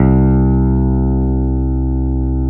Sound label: CP70